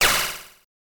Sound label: shot